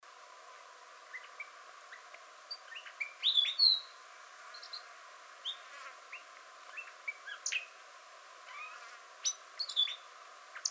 Arabian Grosbeak

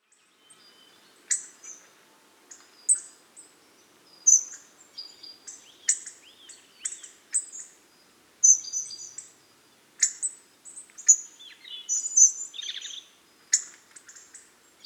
Hawfinch

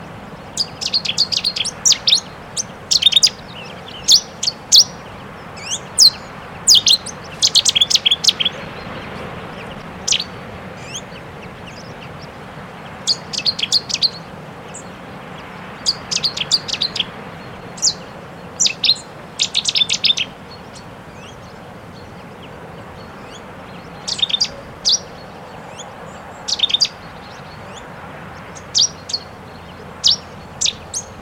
Cettis Warbler

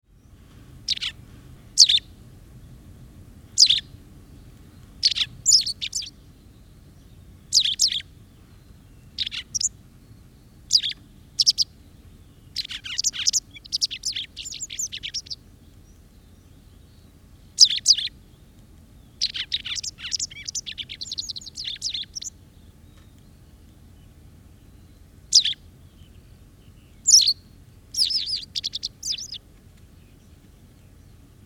White Wagtail

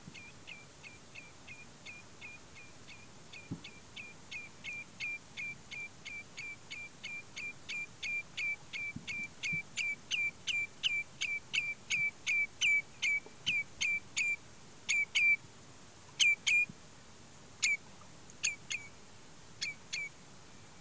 Common Redshank

